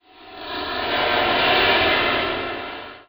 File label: Transition Feedback Distorted; Production Element